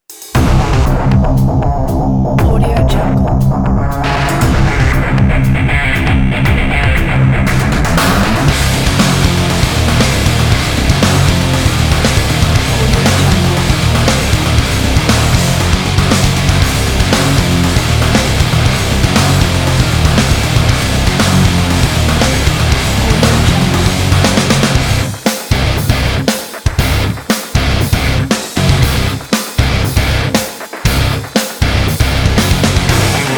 high adrenaline

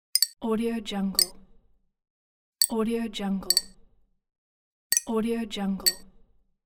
glass button

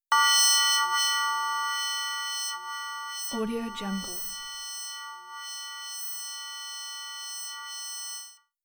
scifi sonic movement ambiance

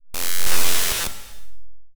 electricity arc; soundmorph

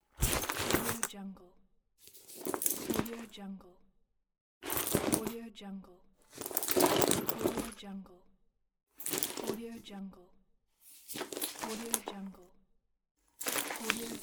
purse

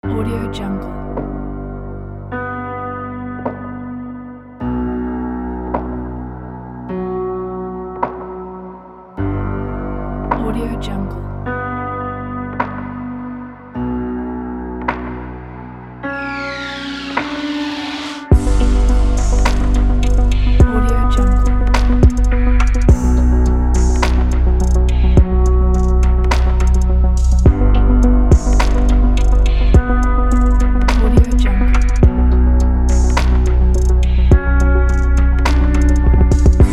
dark city

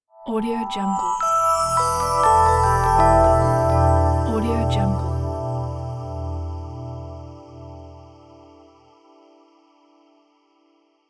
soft inspirational bells

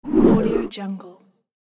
whoosh

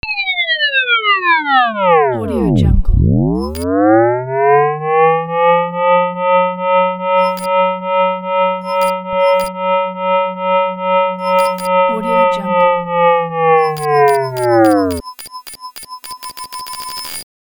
indicator digital dosimeter

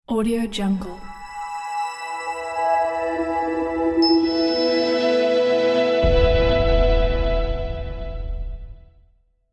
bright logo

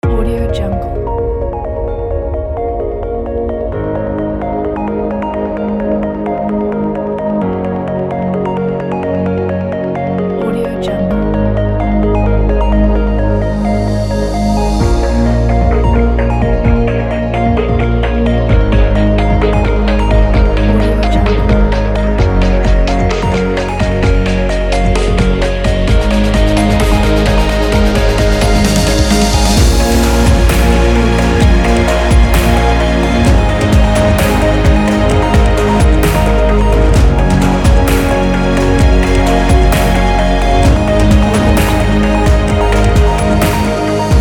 abstract electronica

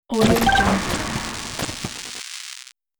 welding droid

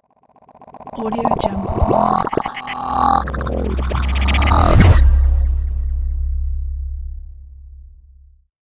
impulsive high tech impact

